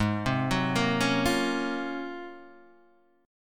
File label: Ab 13th down Guitar DADGAD; arpeggiated; JGuitar